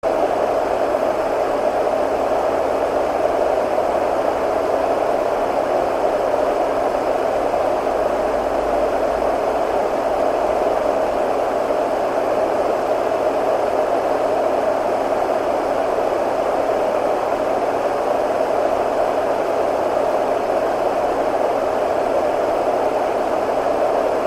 Travel Mini Sound Dohm

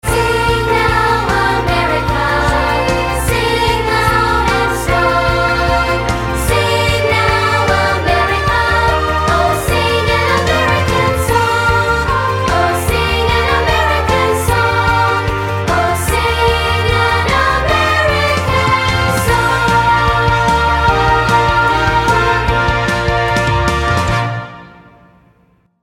American Song Vocal

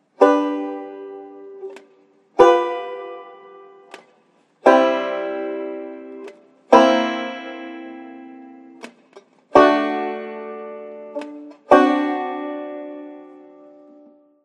2nd Inversion Chords; 2nd Inversion Major To Dominant Chords (G to G7, C to C7, D to D7)

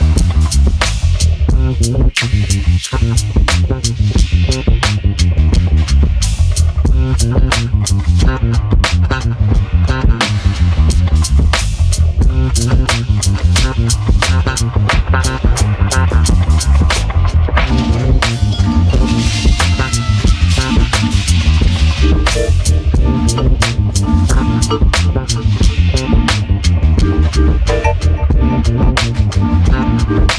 Freejazz

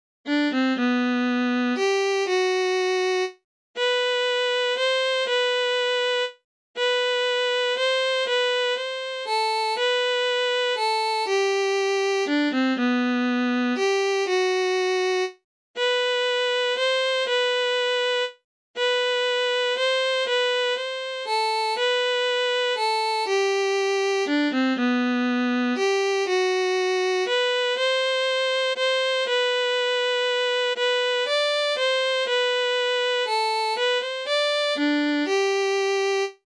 the first noel 2nd fiddle

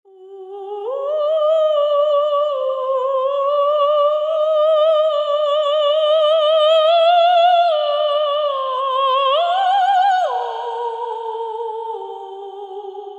Vocal

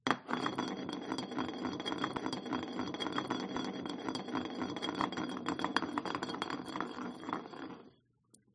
beer bottle spin; mikkeller

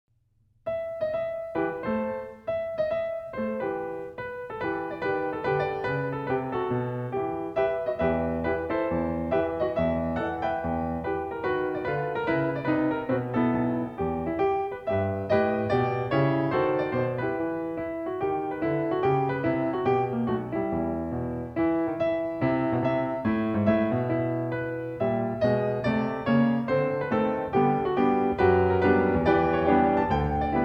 baroqua rag

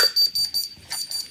MORSE HI

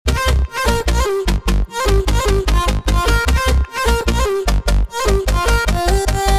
Hearts Drop Loop; Cymatics

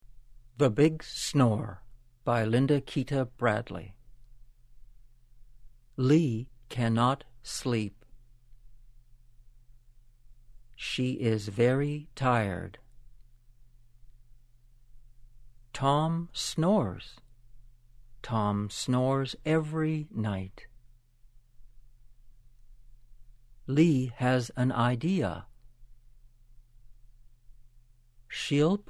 the big snore

